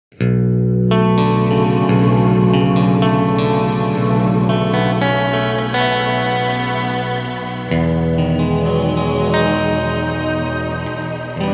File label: swell; BigSky; reverb